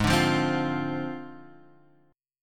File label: G sharp 6th Flat 5th, Guitar, Standard; G#6b5; strummed; 4,5,3,5,6,x; JGuitar